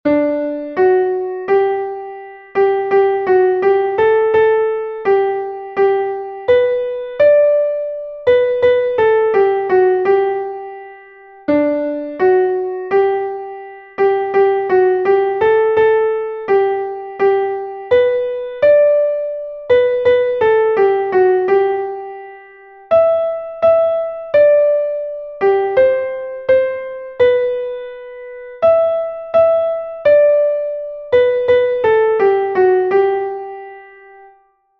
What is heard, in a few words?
melody; once in royal davids city